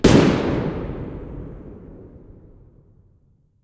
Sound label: mosin muzzle mono distant, weapons